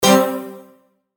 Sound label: chime burst; Multimedia; Chimes; Blastwave